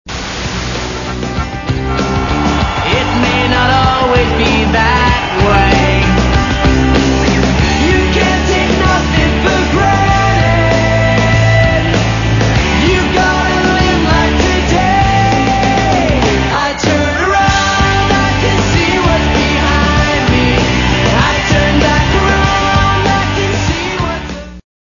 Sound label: band